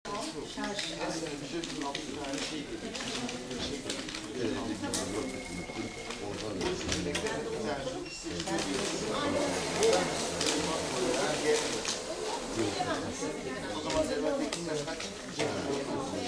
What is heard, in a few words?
bank ambient soundscape